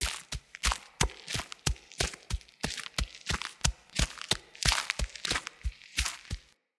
Horse Step Trot Loop Dirt; Mendicant Horse; Foley; Footsteps

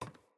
Ladder Climb Wood

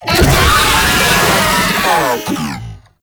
Pain, Vocals; Warframe; Proto Infested; Babau

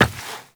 Step Rock, Footsteps, Walk; Warframe; Tenno

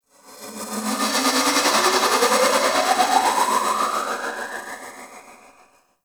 Gas City Distant Textures; Warframe; Corpus Gas City; Ambience

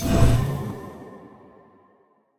Memory Go Board Begin; Warframe; Sacrifice

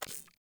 Theme Select Confirm; Corpus

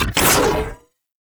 Arch Gun Alt Shot; Warframe; Weapons; Archwing; Hildryn

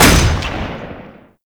Rifle 2D; Warframe; Weapons; Bolto Rifle

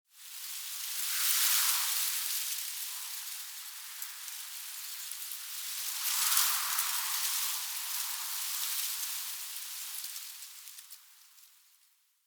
Tree Pine Wind Leaves Relaxed Gust; Trees; Midrath Island; Ambience

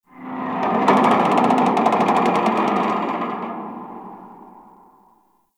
Exterior Metal Debris Creaks; Warframe; RailJack; Ambience

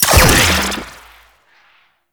Shock Rifle Primary Shot, Weapons; Warframe; Corpus; Shock Rifle Vandal

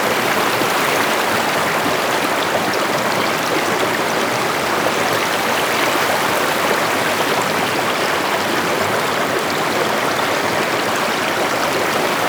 Water River Loop; Ambience; Props; Warframe; Duviri

